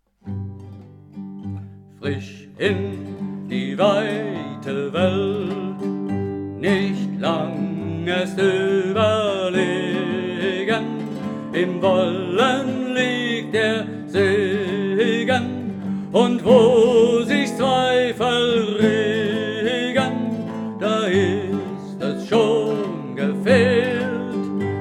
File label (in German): solo dur; frisch in die weite welt